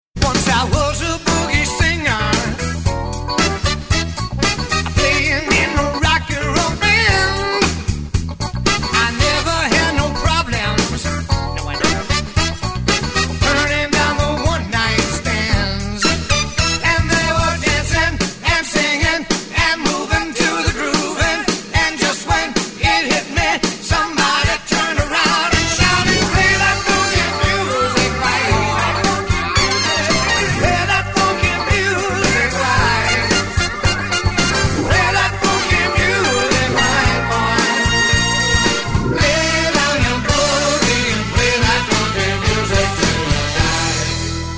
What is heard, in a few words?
funky music; Hitfaktor